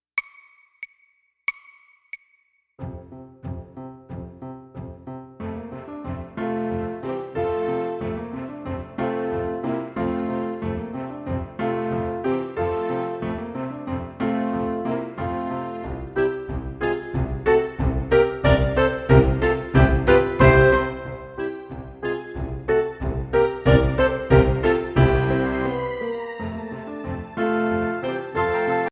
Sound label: Spinning Song; Ellmenreich